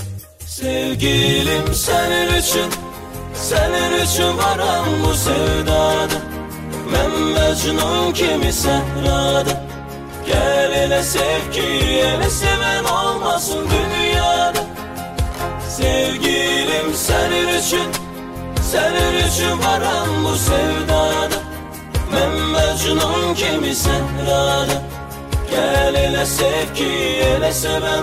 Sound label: Ringtone Mobile